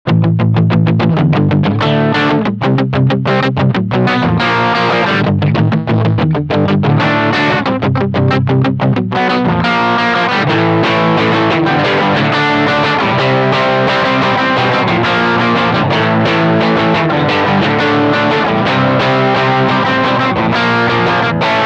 rock riff

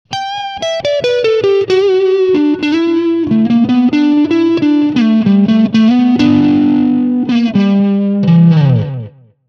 solo dry

